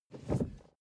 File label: suv 1st gear release quick; verkehr transport; autos